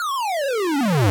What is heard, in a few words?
Powerup-Platformer Jump Rev; viewfinder